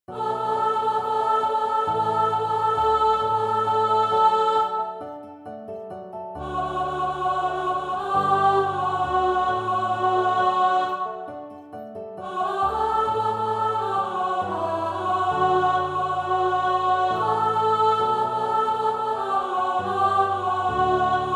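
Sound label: Soprano 2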